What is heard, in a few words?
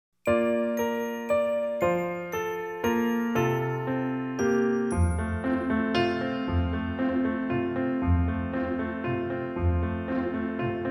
Two Little Eyes instrumental